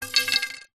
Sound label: sport pool cue drop hard ground